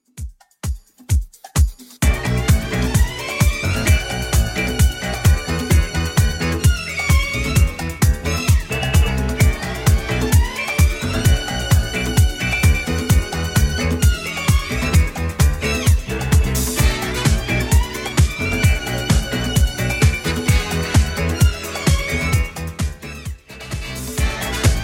70s Redrum 130bpm

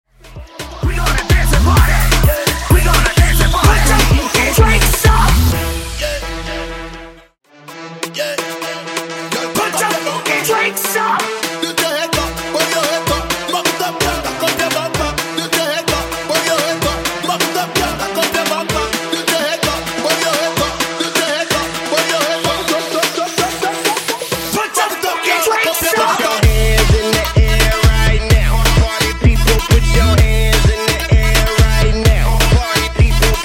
Hype Dj Intro Outro Dirty 128 Bpm

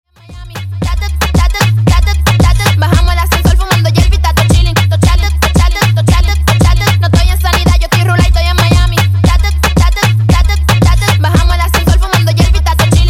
Dj Intro Outro 114 Bpm; Dj Intro Outro Edit

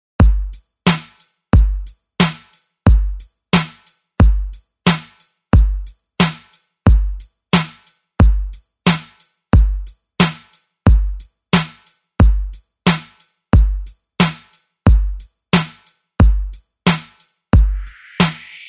ReDrum; DJ Mhark

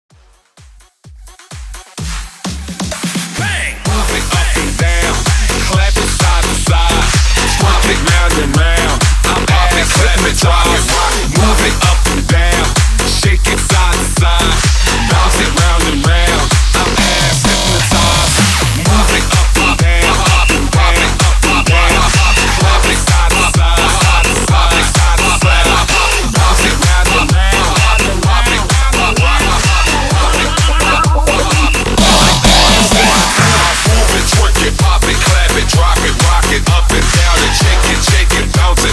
Dancehall Edit 128 BPM

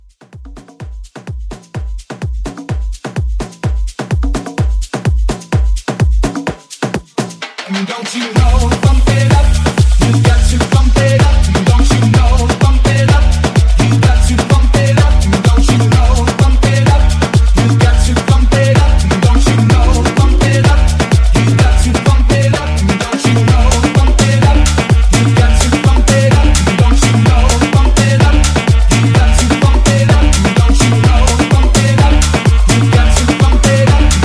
Tribal Party Break

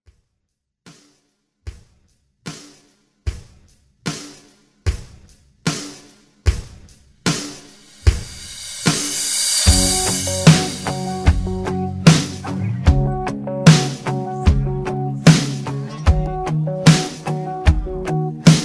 Country Redrum

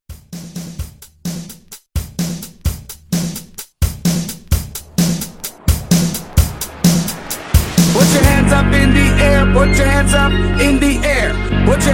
90s Re Drum 129

